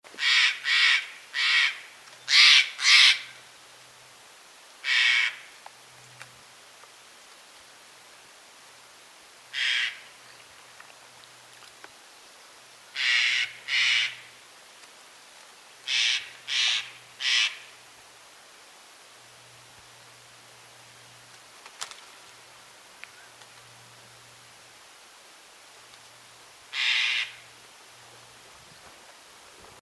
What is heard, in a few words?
Stellers Jay Alarm Cyanocitta stelleri; Bobby Wilcox